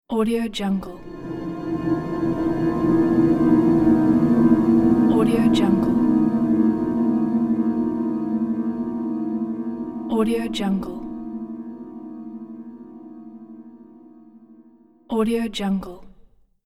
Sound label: somewhere mystery transition